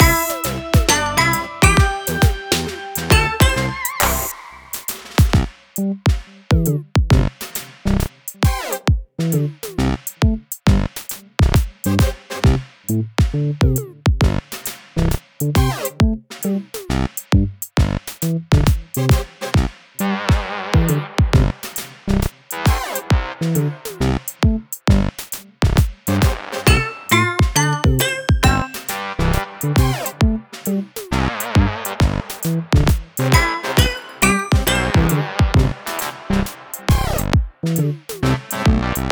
Piano

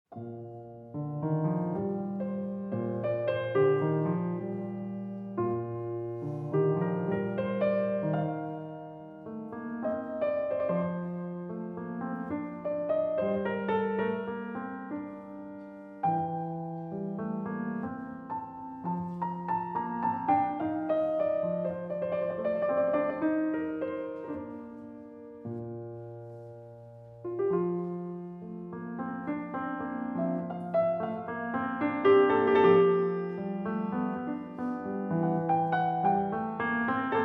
Sonatina II. Andante

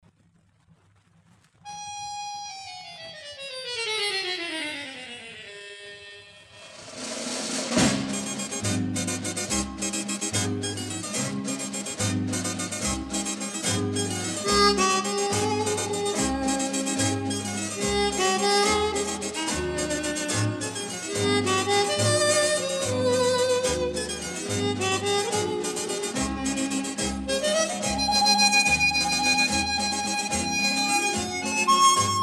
Harmonica Orchestra

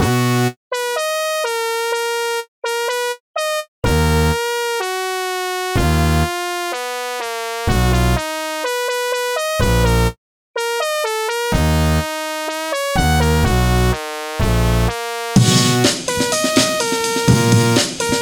instrumental

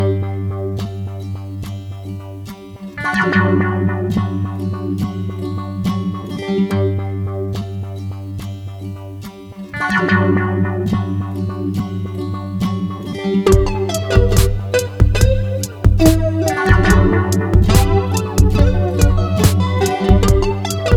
Rain Song

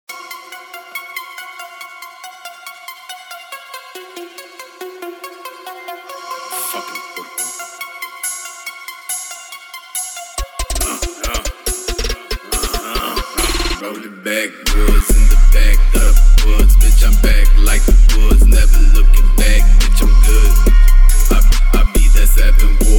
31-39hz LowBass

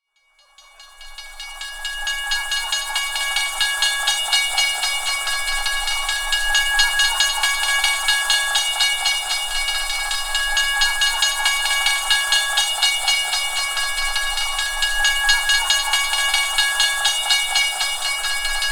Suspenseful Music, Horror Music Orchestra

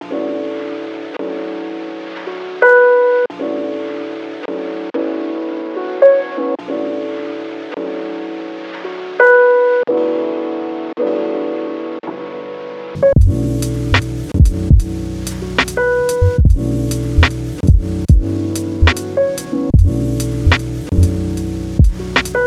jazz

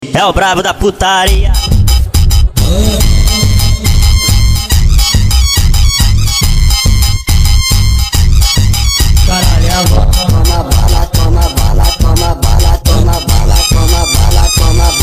funk

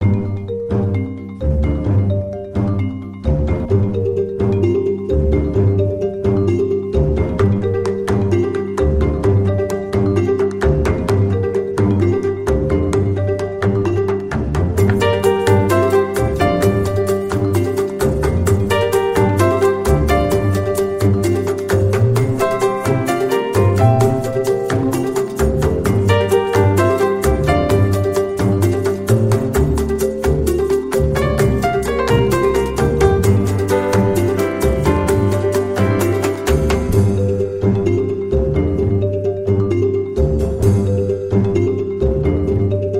Blues Instrumental

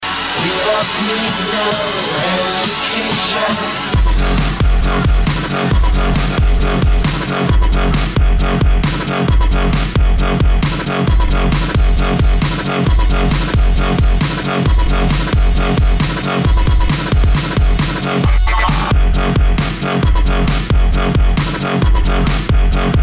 breakbeat

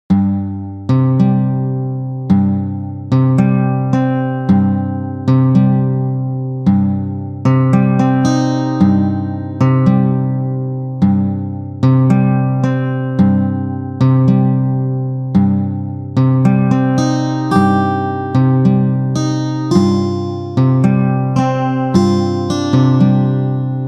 Acoustic Tragedy

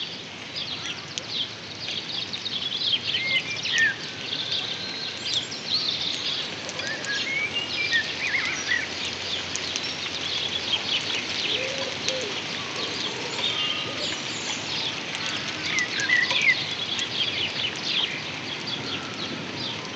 Classical Music Orchestra Relaxing Piano Music

